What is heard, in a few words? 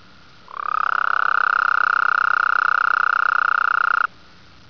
gulf coast toad call; Texas; amphibian watch; texas nature trackers